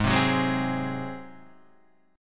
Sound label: G sharp 7th G sharp down Guitar Standard; G#7; strummed; JGuitar